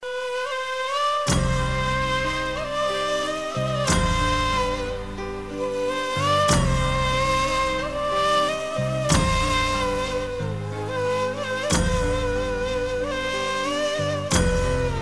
Arabic Sad

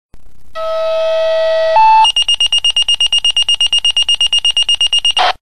911 Pager Tone

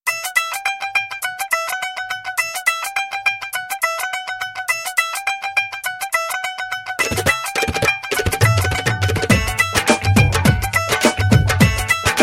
Tumbi

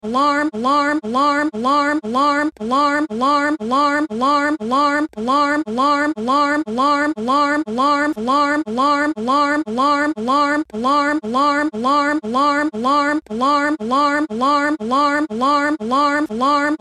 Alarm; Liza Koshy

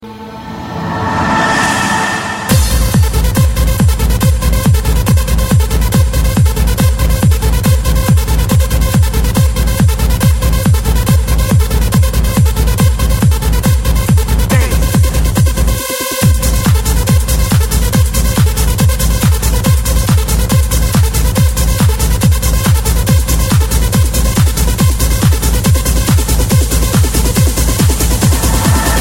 Bass attack

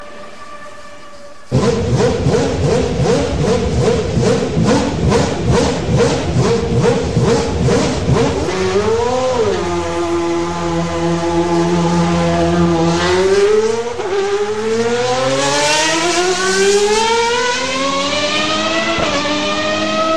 Ferrari V12

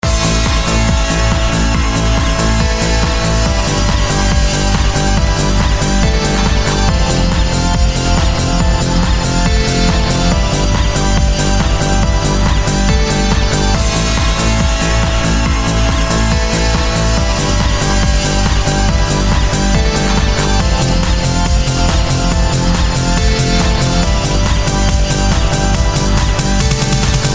Videogame Fight

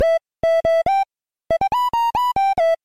8-bit

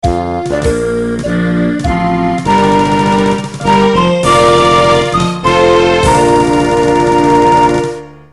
Us Anthem

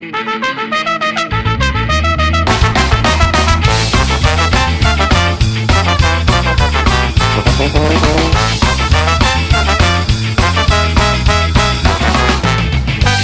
Ska

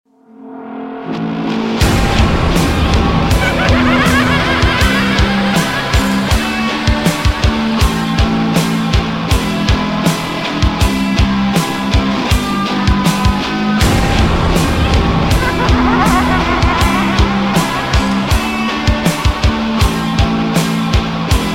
Game Over

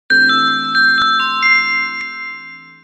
Message Tones

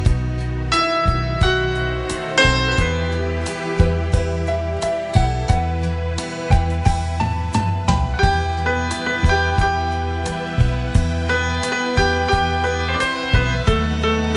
Romantic Violin

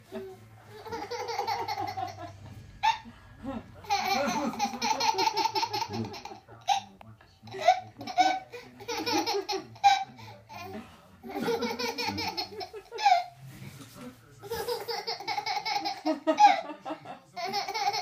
Laughing baby

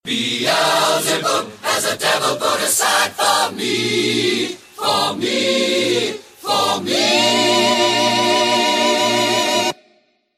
Operatic Section

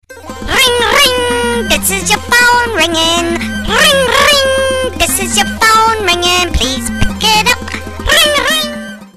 Country Ring Ring

